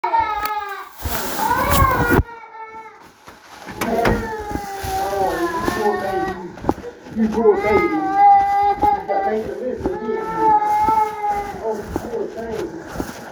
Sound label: Crying